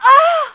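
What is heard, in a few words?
die woman; outlaws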